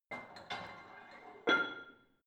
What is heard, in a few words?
barbell plate put on hit ring soft; sport freizeit; fitnessstudio